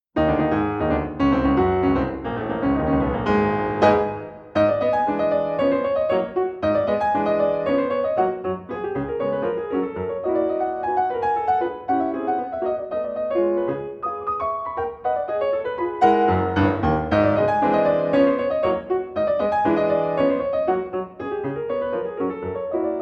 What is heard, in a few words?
rags g-sharp minor frenetic